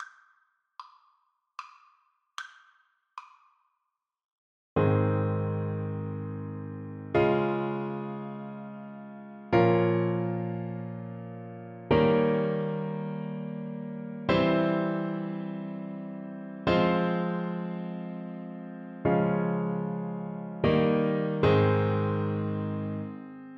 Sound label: French Horn